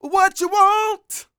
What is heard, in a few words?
SING, Vocal Planet R&B & Dance; ILIO